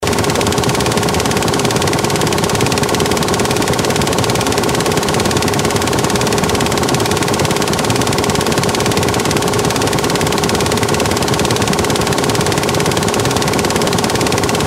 フィルムの音 映写機; 「演劇・舞台」の効果音

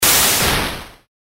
雷魔法 小 モノラル; 魔法効果音

